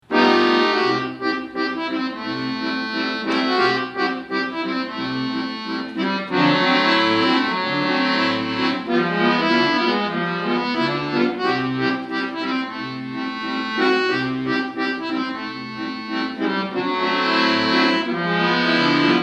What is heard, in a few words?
swing; Akkordeon